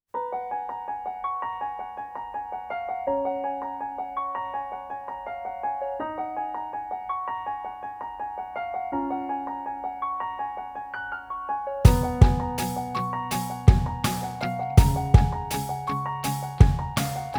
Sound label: rainy tone (Instrumental)